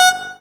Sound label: Synths C; Vengeance Samples